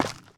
dirt; firefight

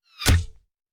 Bullet Impact; Sound Ideas; Guns

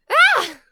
Scream; blindside

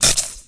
Web Stream Shot, Weapons

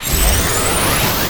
Scifi Power Up; Energy & Forcefields

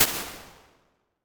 Theatre, impulse responses

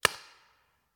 Paintball Gun; Sound Ideas; Guns

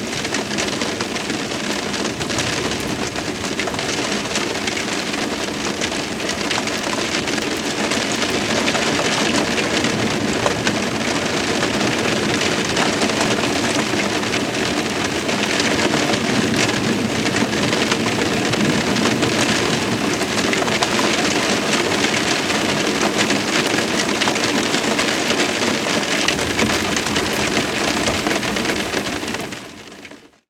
rain window inside; best service Blue Box; rain thunder

